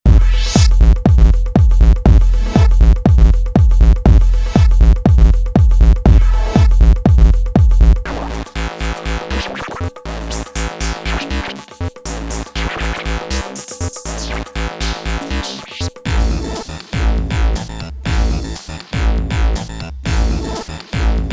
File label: Preset Pattern